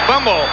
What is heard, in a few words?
Fumble; HyperFootball v2.00